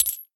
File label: Coin Collect; Angry Birds